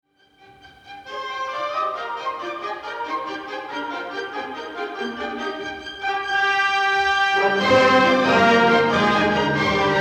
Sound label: Symphony No. 5 in B-flat III. Scherzo. Molto vivace; Bruckner